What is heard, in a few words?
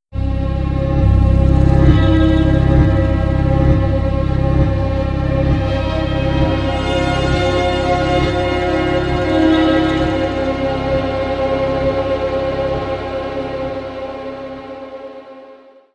mission failed; gta iv